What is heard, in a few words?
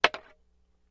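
Staff Hits Light Scrape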